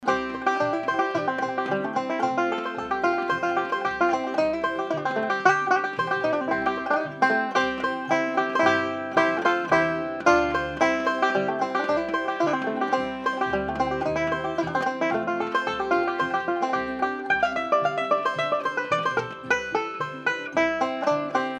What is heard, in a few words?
Shure 300 Banjo